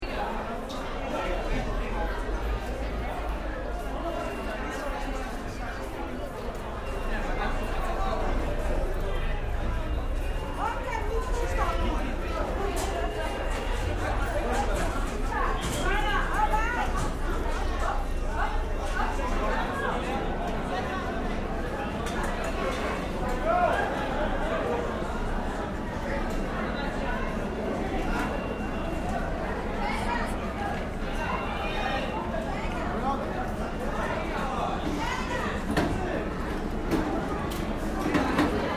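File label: Dense Crowd Central Park New York City Heavy Chatter Walla; Dense Crowd, Central Park NYC, Heavy Chatter, Walla. Crowd gathering before a demonstration.; Crowd Sound Effects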